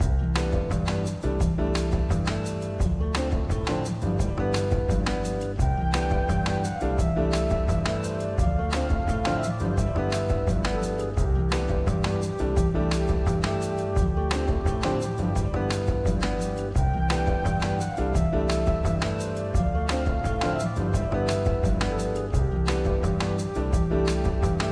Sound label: bossa; springyspringo